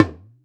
AFRK HARD; AKAI S-Series CD-ROM Sound Library VOL-2

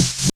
REV SNARE; Back In Time Records; Big Bang; THE HIP

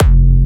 Jump Kicks, Bassdrums

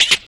RSS, 160 BPM

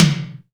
SUPER, Drum Mashines, SR-16 DRUMS; EdgeSounds

